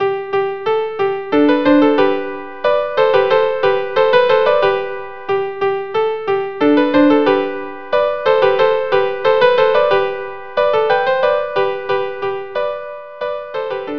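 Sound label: viola viola; Corette; Cotillon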